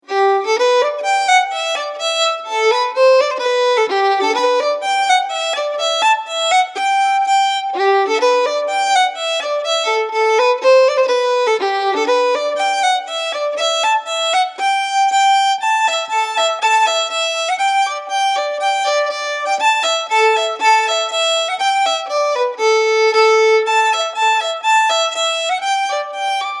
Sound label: Polka; Ballydesmond